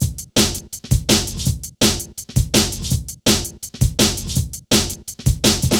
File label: Hard Stepz, Jungle, Drum Loops; 165.5; Zero-G Jungle Warfare 1, 2 & 3; 90 s Sample CDs